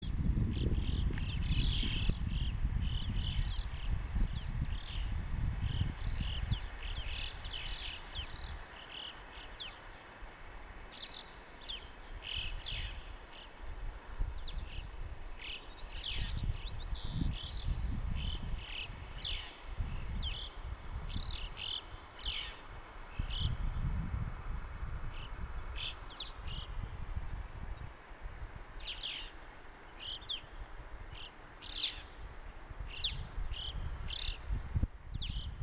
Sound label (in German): Alpendohle Stimmruf